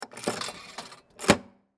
Arcade Game Push, Technology, Arcade, Game, Push, Quarters, Into, Slide, Mechanism; BlastWave